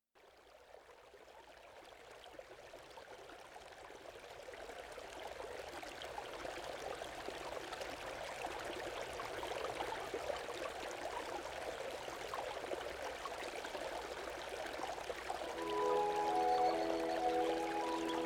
Morning Mood, Nature Music Birds

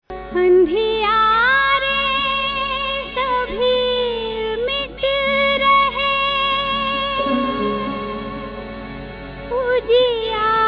Andhiyare Sabhi Mit Gaye, Devotional

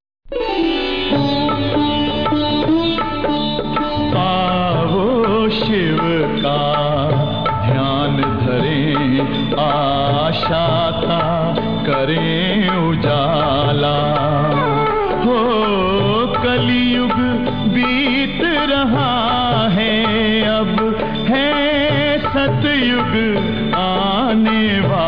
Aao Shiv Ka Dhyan Dhare, Devotional

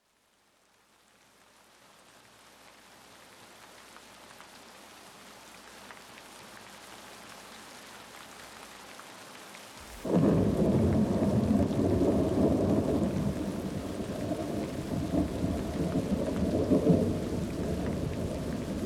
Adagio, Nature Music Birds

